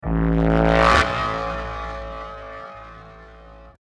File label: Trance Sweeps; VEC2